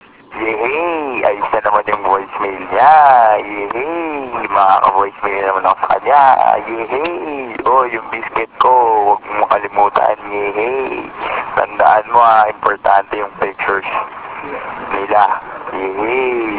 yehey

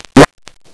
fart

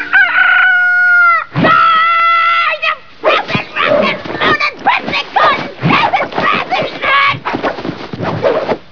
tantrum

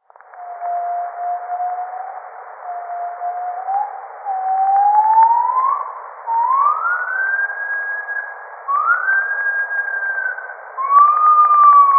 solo culmination, healing nature

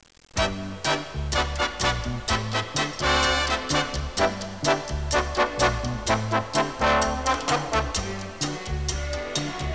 Cha Cha Cha

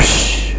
type

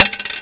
coin

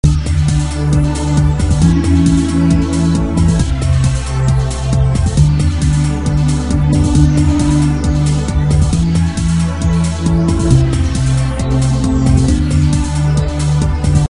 trance

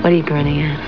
grinning

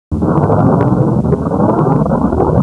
atomic, explosoes; combates

